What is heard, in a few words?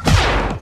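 scratch, zaps and beeps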